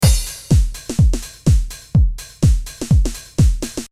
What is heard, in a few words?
Beat Full 125BPM